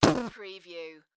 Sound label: Whoopee Farting Blowing Raspberry Sound